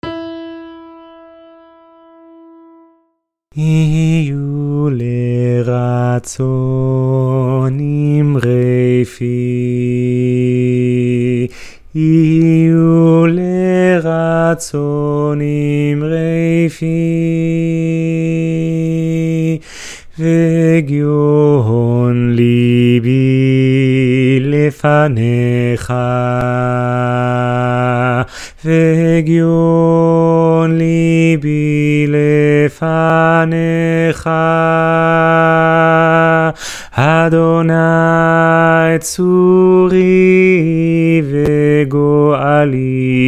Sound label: alto; yihyul ratzon wohlberg